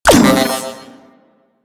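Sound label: Drone Projectile Land; Warframe; Corpus; Corpus Lich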